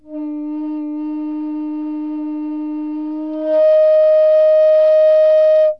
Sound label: Prototype Test, Flutes